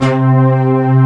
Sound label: Synth Ensemble C2; Digital Sound Factory Xtreme Lead